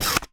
Camera Single

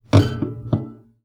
Classical Guitar Extended Technique

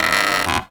Polystyrene Creak

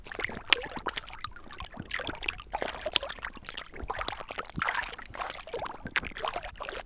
Splashy, Mud

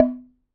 Wooden Bar Hard Strike

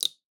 Drips

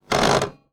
Typewriter

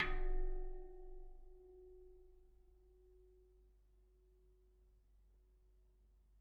Bicycle Spoke

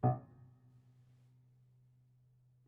Prepared Piano

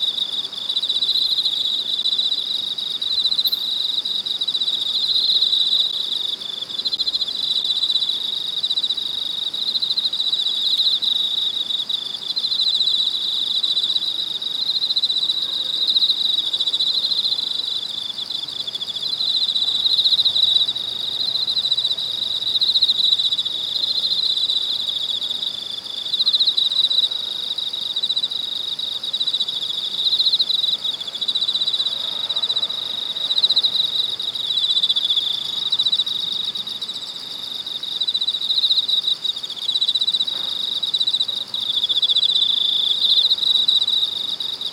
Cicadas; Animals

